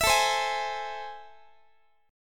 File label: A Minor Major 7th, down, Guitar; strummed; AmM7; x,x,19,19,16,19; JGuitar